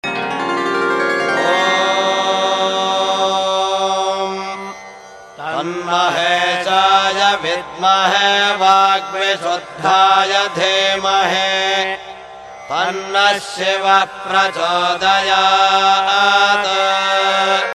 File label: shiva gayatri male voice